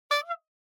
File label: Bicycle Horn